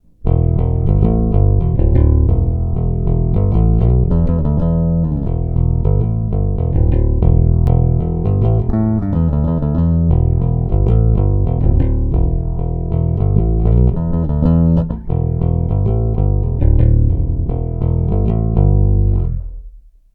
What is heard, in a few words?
Pick Humbucker